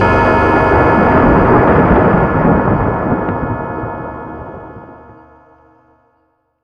wheel of fate only bell

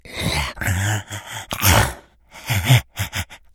idle breath, infected breathing; npc; szf

